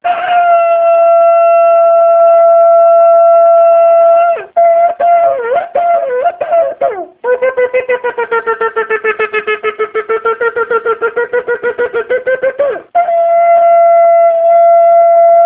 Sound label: shofar all; tishrei